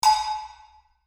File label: cowbell